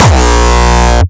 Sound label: kick insane